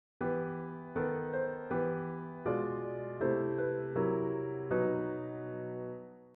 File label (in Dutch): gealtereerd, gealtereerde akkoorden